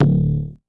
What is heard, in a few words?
Tumpa Bass; Big Fish Audio; Old Skool House; OSH Bass